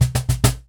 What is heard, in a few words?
Cajon Fill; percussion; musicradar